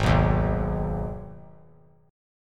Ab Minor Ab down; Abm strummed; JGuitar

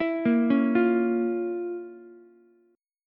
F Major 7th Suspended 4th Sharp 5th, Ukulele; arpeggiated; JGuitar

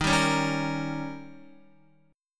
Eb 7th, Guitar; x,6,7,6,7,6; Eb7 strummed; JGuitar

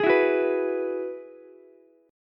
G sharp Minor 7th Sharp 5th, Ukulele; strummed; 13,16,14,14

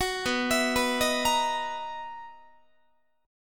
B Major 11th B down Guitar; arpeggiated

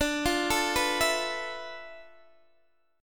D Minor 6th Add 9th D, Guitar, DADGAD

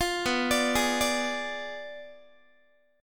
B Diminished 7th B down Guitar; arpeggiated; tuning 4A,4E,5A,5D,5G#,6C#

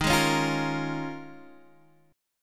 Eb 9th Suspended 4th, Guitar, Open D

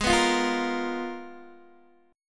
Ab Minor 6th Add 9th, Ab, Guitar, DADGAD

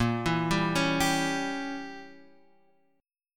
Bb Diminished 7th Bb Guitar Drop D; arpeggiated; JGuitar

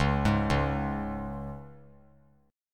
Db 5th Db down; Db5 arpeggiated; JGuitar

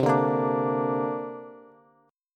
C 7th Suspended 2nd down Bass; C7sus2 strummed; JGuitar

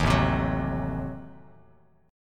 A Diminished 7th A down Bass Standard; Adim7 strummed; JGuitar

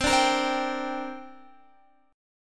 C 7th Suspended 2nd, Guitar; C7sus2 strummed

